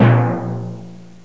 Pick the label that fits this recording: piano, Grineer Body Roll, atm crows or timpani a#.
timpani a#